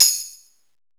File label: TAMB RX5; Greytsounds VOL-1